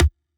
reels stop; DragonsLucky8WD; wazdan; ultrafast

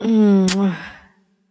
kiss; Beauty Fruity; wazdan

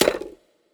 gambler mouse click; gambling; Beauty Fruity; wazdan

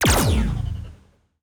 gambler win; BARs 7s; wazdan; ultrafast

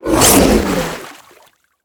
win water; DoubleTigersWD; wazdan

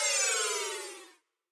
risk lose swap; gambling; American Poker Gold; wazdan

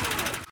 spin finish; BARs 7s; wazdan

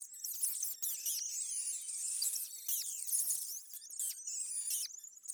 onmouseover; Colin The Cat; wazdan

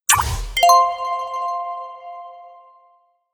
bonus enter; Beauty Fruity; wazdan; tutti

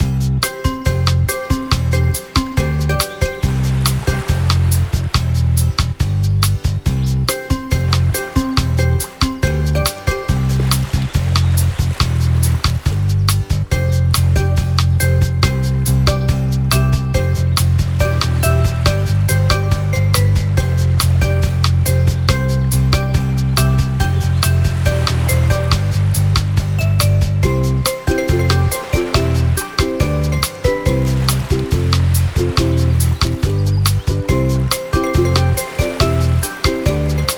love bonus ambient; Beauty Fruity; wazdan

